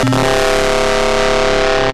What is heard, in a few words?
Ping Pong & Pitch Wheel